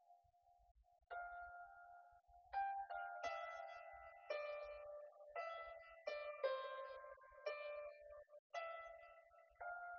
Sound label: nodrums liverec; SiSEC08; SiSEC underdetermined